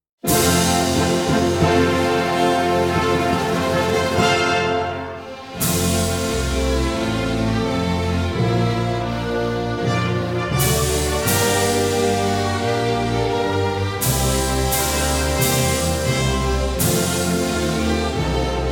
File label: hymn without words